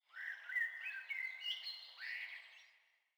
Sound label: sting songbirds; Rust